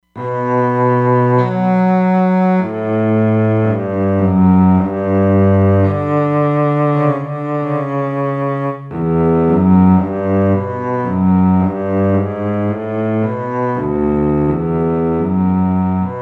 Contrabass; Roland Fantom XR

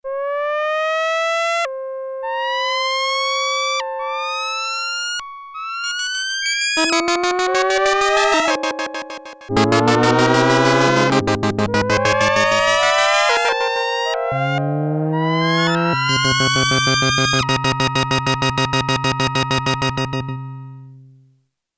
Ascending Bleeps, Prophet-5; Sequential Circuits Prophet-5 (Ver.3); Factory Patch; File 2 Programs

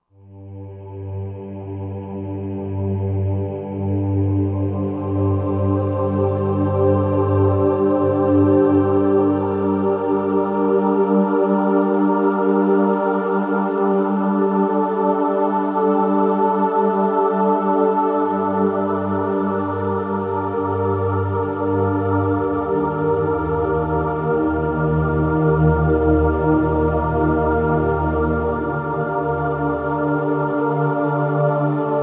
Milky Choir, Roland SR-JV80-13 Vocal Collection

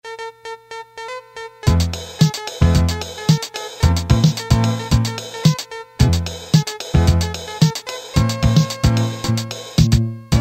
16 beat, Casio MT-500 Rhythms

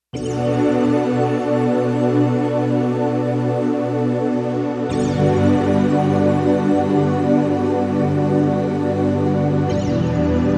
Rainstick Chr; Digital Synthesizer

